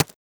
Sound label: ice dig; Too Many Stones